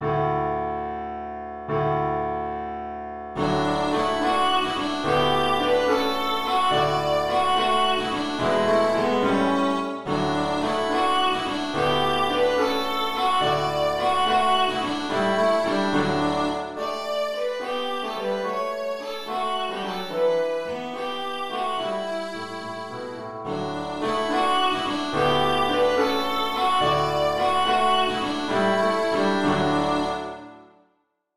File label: violin